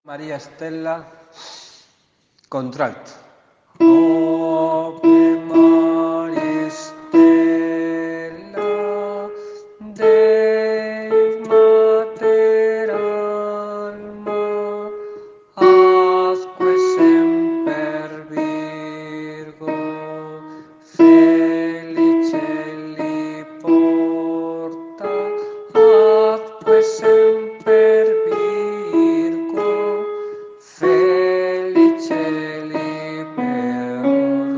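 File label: alto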